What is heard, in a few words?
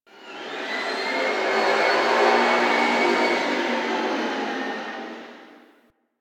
Rail Jack Destroyer Machine One Off; Ambience; Crew Ships; Grineer